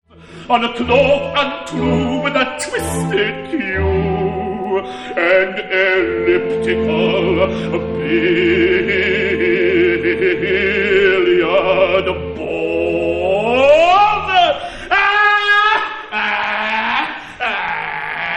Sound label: laugh